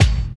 original kick one shot a key, Royality free kick drum sound tuned to the A note; kick drum samples